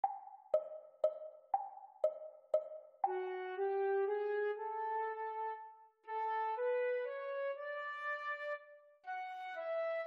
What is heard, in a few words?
Flute Duet; Grade 6; Exam Speed; The Teacher part; Kummer; Easy Duos